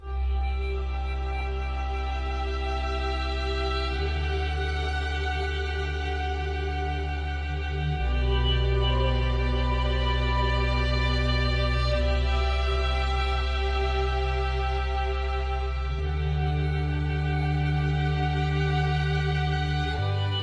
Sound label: Care Ambient